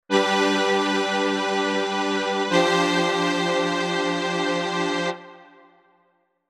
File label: Emu strings